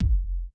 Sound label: BD Squash